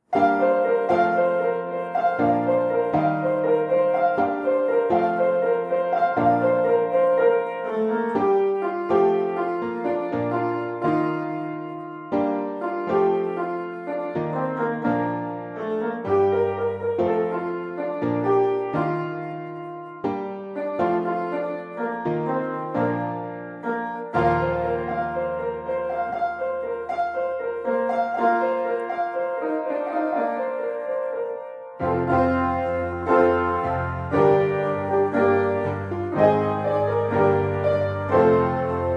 Piano Cover; Everfree Radio

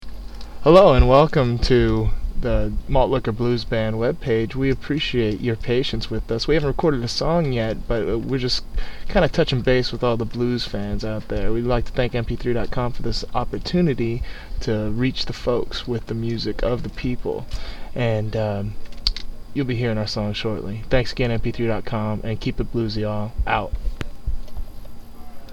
Jazz Test

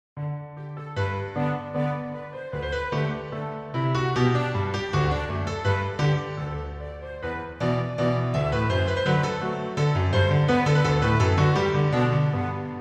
orchestral version; MLP Rarity; Everfree Radio

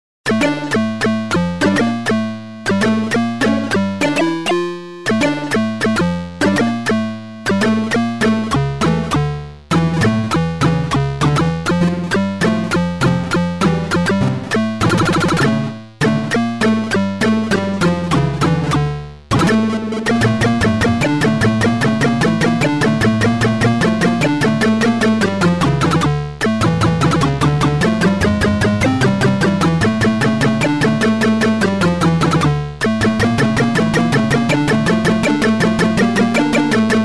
Chiptune Remix; Everfree Radio

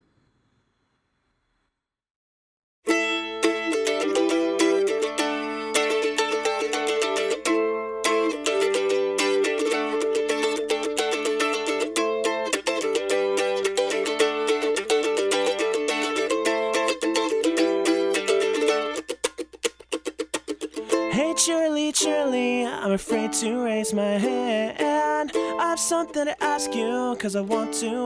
Live Mandolin Cover; Everfree Radio

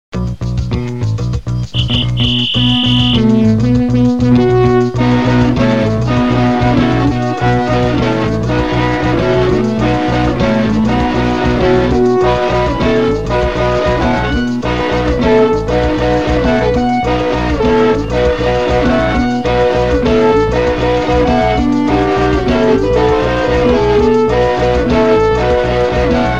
Samba

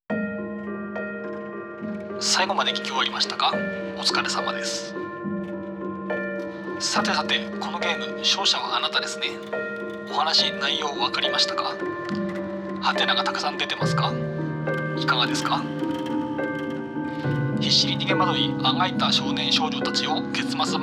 instrumental; Vocaloid; Hatsune Miku

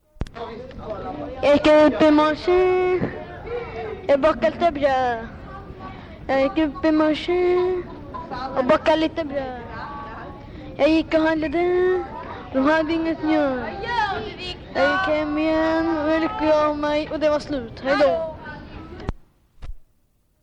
Blues

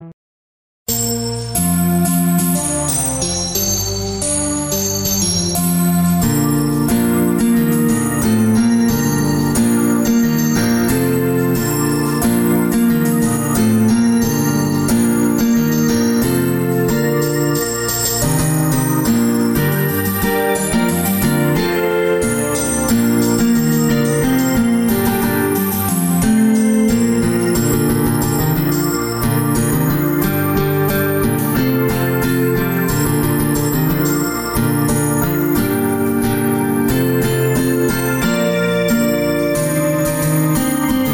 relaxing cover; Everfree Radio

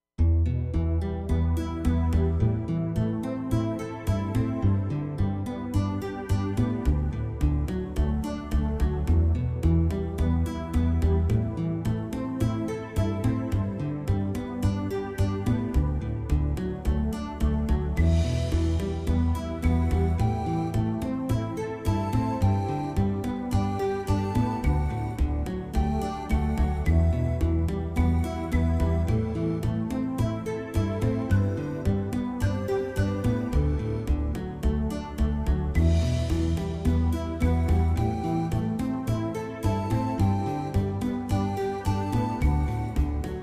Theme Instrumental

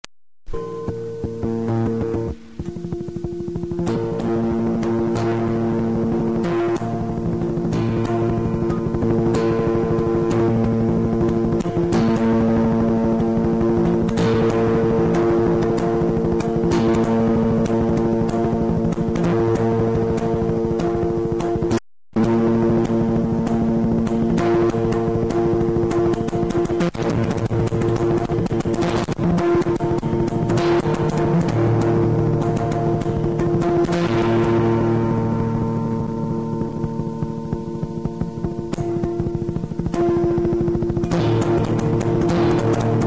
Video Game musix

